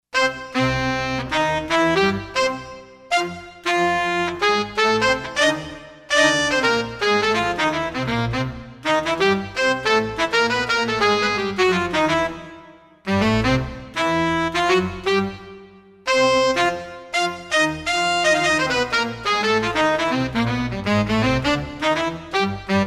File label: SAX LIVE, Roland SC-880; Factory Patches